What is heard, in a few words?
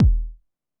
999 Kick Click, drum kit; Concrete Collage Drum Kit; Concrete-999